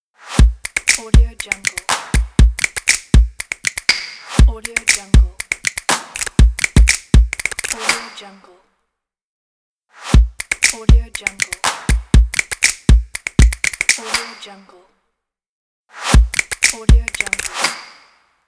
snaps stomps and claps logo

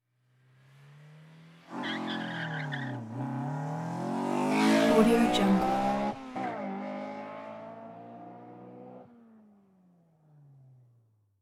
street race car distant peel out and fast away; hollywoodedge

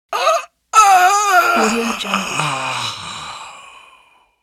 dying male groan

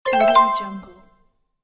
achieved

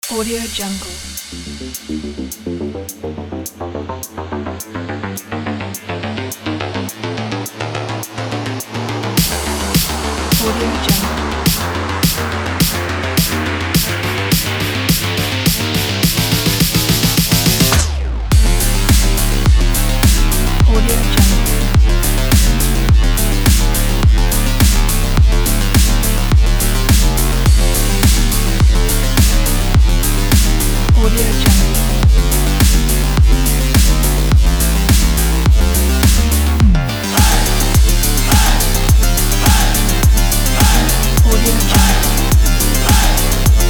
sport electronica

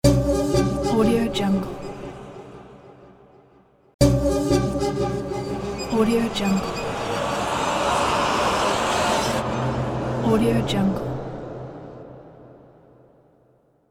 alien string stalking atmo

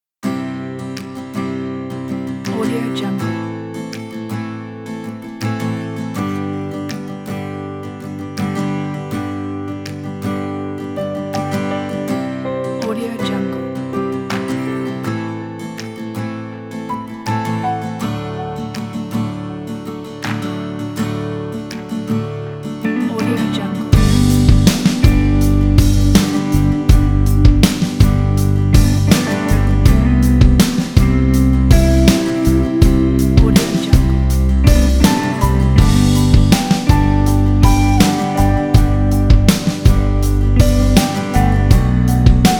romantic ballad

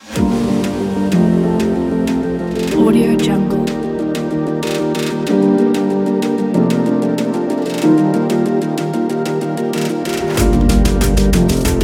melodic dubstep

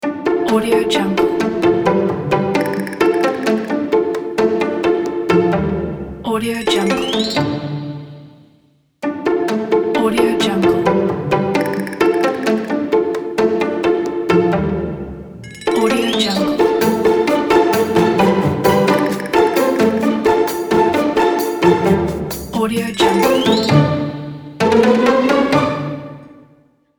funny logo

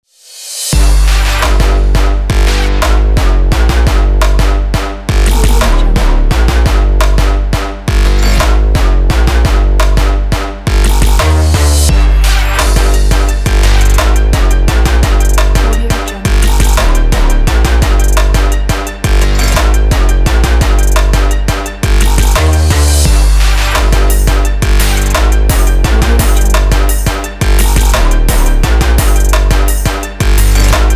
aggressive trap

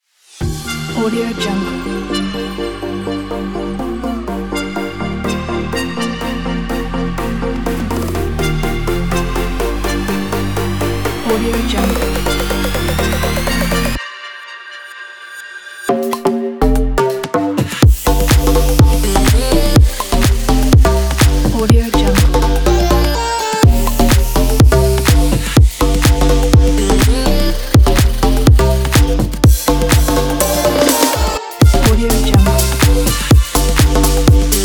future house

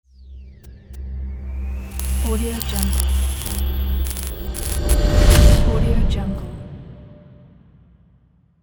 glitch intro logo; intro-boom

